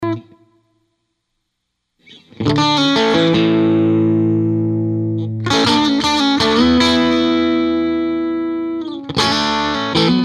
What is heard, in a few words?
strat